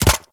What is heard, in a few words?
Micro Land; Warframe; Corpus; Arachnoid Micro; Steps